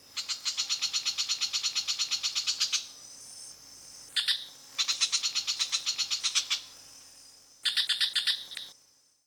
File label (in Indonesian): cendet coklat alarm